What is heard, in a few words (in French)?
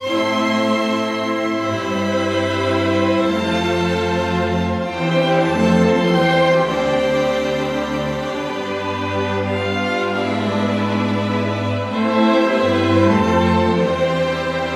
sérénade pour cordes